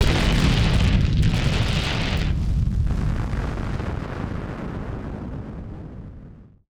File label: Firework FX; musicradar; bomb factory